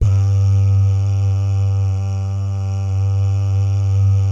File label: BS BAH, Vocal Planet Jazz & FX; ILIO; BAHS GUYS